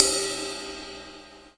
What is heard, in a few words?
ride long, DRUMS SINGLE HITS